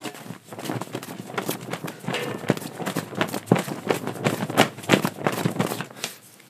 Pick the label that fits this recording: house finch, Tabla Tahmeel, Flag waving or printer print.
Flag waving